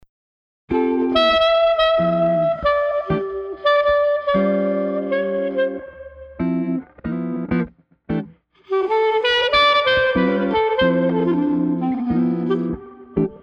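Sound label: Street Clarinet Guitar Sentimental, 95 bpm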